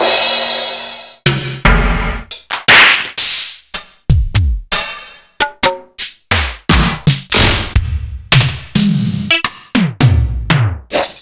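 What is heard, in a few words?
Roland MV8800 drum SP; SP-8800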